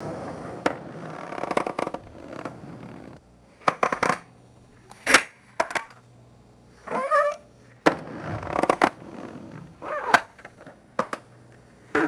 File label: subtle wooden skateboard deck creaks; sports